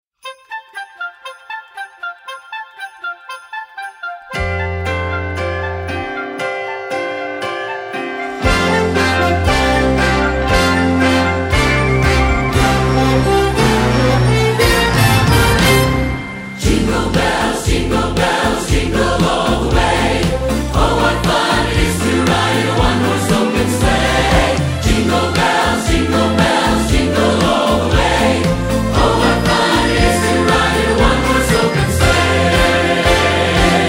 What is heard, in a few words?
Jingle Bells Medley